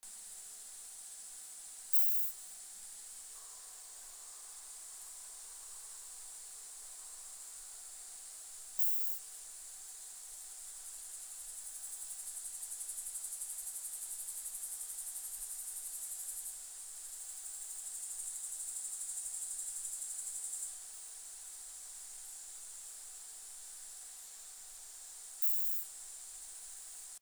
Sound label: Isophya pienensis, Thaytal